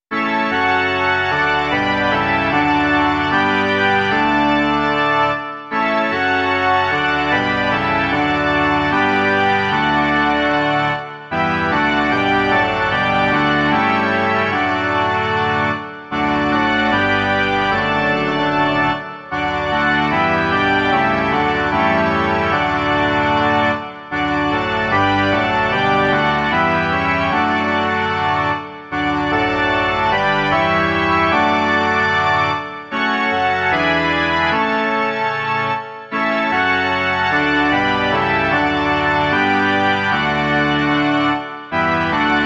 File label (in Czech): varhany; Pochválen buď svatý Bůh náš